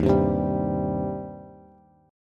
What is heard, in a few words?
Eb 6th Eb down Bass; Eb6 strummed; JGuitar